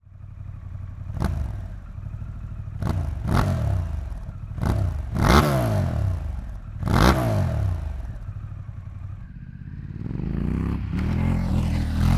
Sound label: Pan Am Open